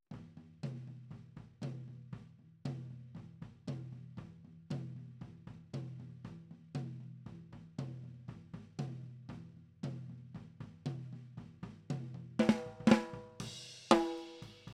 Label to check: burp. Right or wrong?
Wrong